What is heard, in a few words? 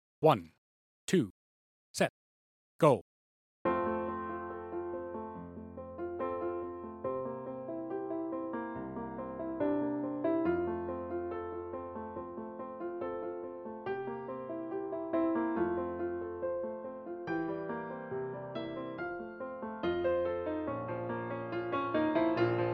Tempo 70 bpm; F Strauss; tmea solos